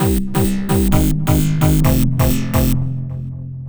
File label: Synth, 130bpm, Nu Dance Electro; Zero-G; Soundclash; Combi Loops; The Oldskool